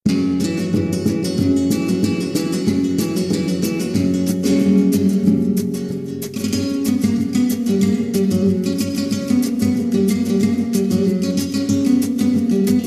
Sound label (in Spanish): Zambra mora